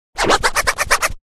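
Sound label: scratch sound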